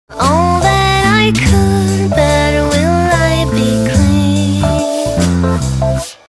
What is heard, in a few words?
snare pan